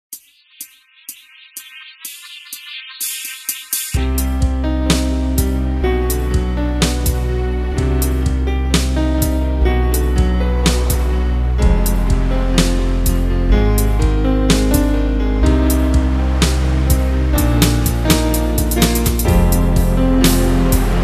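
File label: sea waves instrumental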